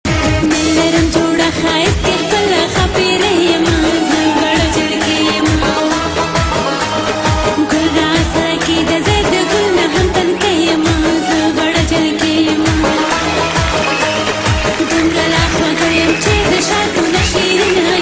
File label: Afghan music